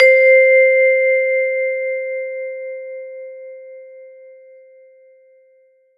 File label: Roland SC-88 Vibraphone C5